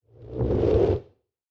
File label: whoosh reverse sucking vocallike humming whomp